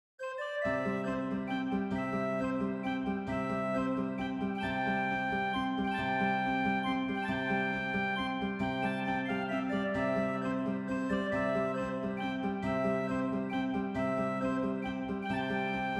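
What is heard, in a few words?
clavichord accompaniment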